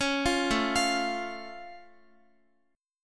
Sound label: A Augmented A, Guitar; x,x,11,10,9,12; 4E,4A,5D,5G,5C,6F; arpeggiated